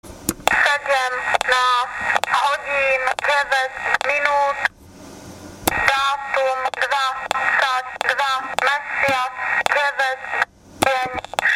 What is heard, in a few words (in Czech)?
budík